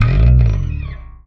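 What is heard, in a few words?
slap, bass; Xsample VOL-1; SLAP+THUMB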